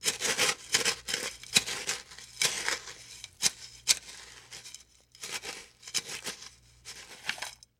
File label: Industry Tool Shovel Dig Light